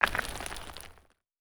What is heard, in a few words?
gravel stones small avalanche